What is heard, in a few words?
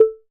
Electronic, notifications; Oppo Find X5